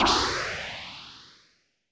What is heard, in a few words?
hollow spacey whoosh; JumpinJack